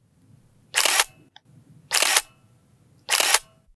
camera shutter of 35 mm slr camera

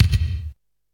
large room kickdrum sound e key

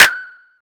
hand clap f sharp; percussion; single hits one shots

